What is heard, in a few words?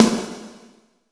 VERB SN; East West - Phat & Phunky